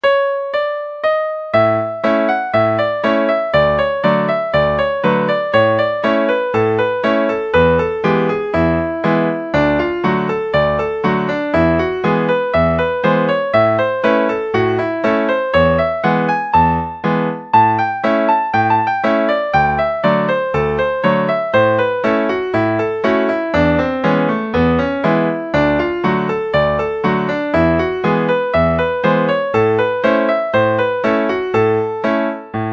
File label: banjo signal